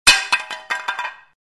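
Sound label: sounds of table plates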